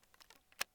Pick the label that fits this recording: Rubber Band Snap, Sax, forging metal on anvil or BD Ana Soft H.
Rubber Band Snap